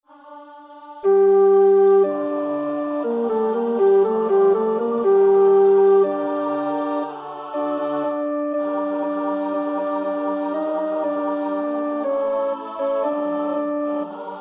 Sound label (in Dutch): tenor, koorpartij oefening; Schütz (Heinrich); Matthäus Passion SWV 479